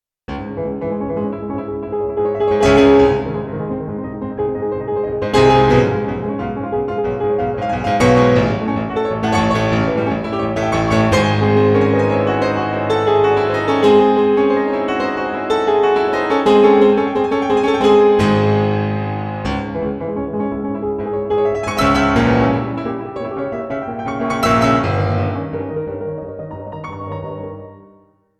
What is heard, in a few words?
bluethner expressional; beethoven